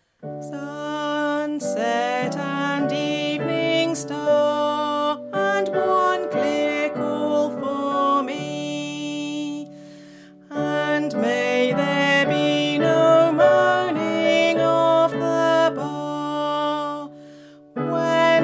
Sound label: Crossing the Bar Alto, Choir